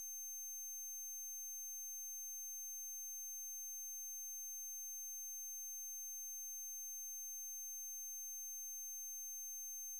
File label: 6300Hz